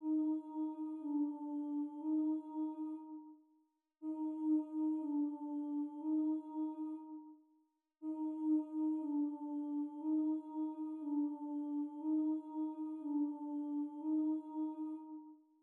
buzzing exercise, trumpet

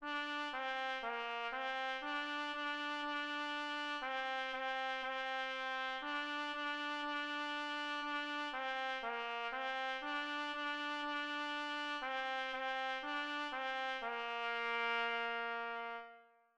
merrily we roll along, trumpet

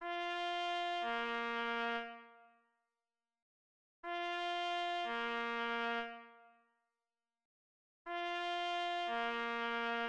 open valve, trumpet

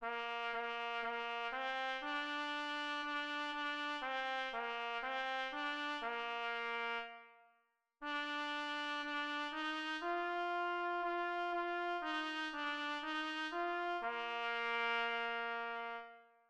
itsy bitsy spider, trumpet

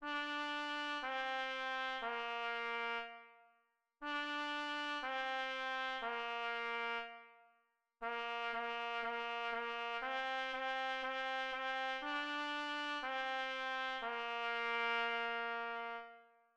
hot cross buns, trumpet

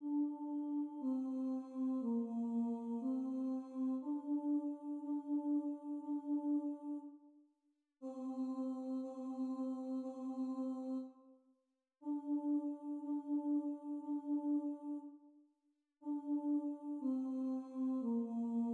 buzzing, trumpet

